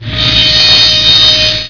buzz

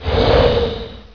swing, pendulum

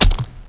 melee flesh

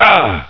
pain; duke

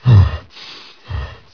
scale gasp

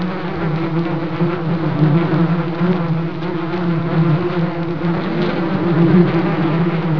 flys

